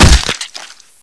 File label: hit bod, weapons; svencoop; echoes